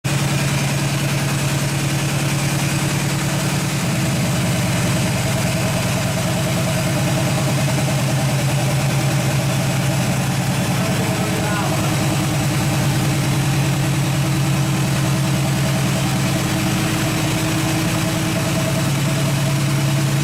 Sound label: air compressor